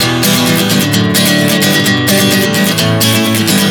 Em7-D-G-A 130 bpm, acoustic guitar chord progressions